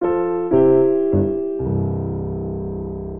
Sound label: Ending Plagal Cadence C Major 150bpm, Grand Piano; MIDI Clips; Ableton